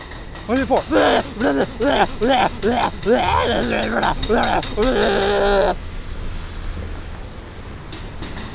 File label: Literal Gutter Punk; In San Francisco